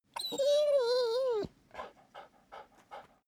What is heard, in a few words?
dog whine pant; natur-tiere; hunde